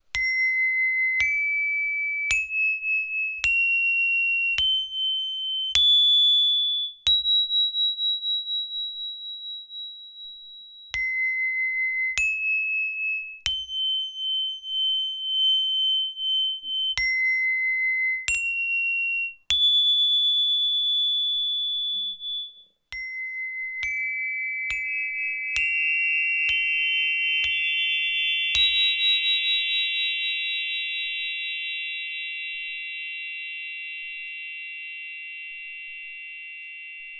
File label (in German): Tonleiter